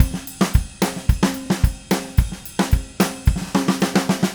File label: Red Maple Groove 110, DW Red Maple Kit